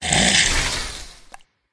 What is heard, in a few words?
riding bug attack voice